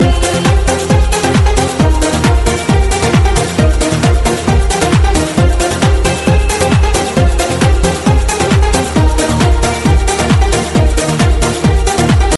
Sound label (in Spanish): electronica sonar castellano